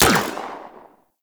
Laser Fire; Warframe; Venus; Venus Terra Moa; Weapons

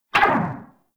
Pulse Laser Tail; Warframe; Railjack; RailJack Weapons

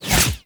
Flak Cannon Alt Fire Pass By; UT Weapons

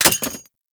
Uzi Mag Drop; Warframe; Tenno; Prime Tenno Uzi; Weapons

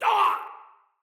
Pain, Soldier Male; Battle; Barks; Dialog; Dendrit

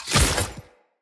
Short Sword Stealth Attack Pull; Weapons; Finishers

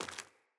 Sandals Walk Rock, footsteps; Player

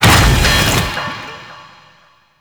Pirate Undertow Impact; Warframe

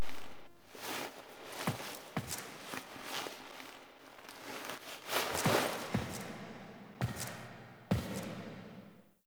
Foley Turn; Warframe; The War Within; Queens Lair; Cinematics

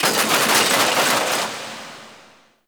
Radar Ship Machine Spin Metal Charge; Warframe; Ambience; Grineer Railjack POIs

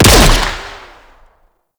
Scaldra Pistol Shot

